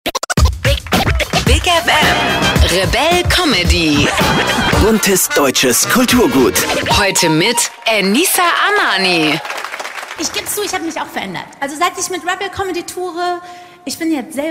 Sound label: Deutsch Rap; RebellComedy; Enissa Amani